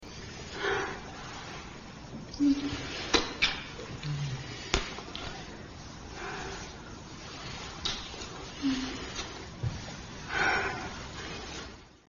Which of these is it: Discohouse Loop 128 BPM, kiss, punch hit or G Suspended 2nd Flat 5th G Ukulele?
kiss